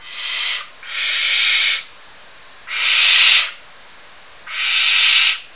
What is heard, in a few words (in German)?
schleiereule